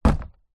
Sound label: cardboard box down on carpet